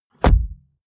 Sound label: car bmw e46 interior door rear close; Cars